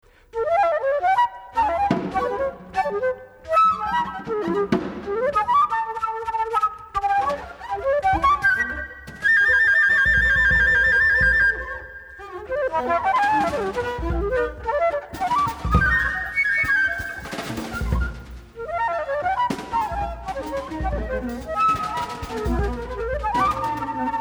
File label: Flute Piece